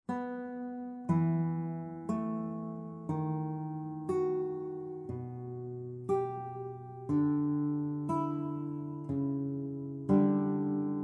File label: counterpoint guitar; CONTEMPLATION; two part species counterpoint guitar compositions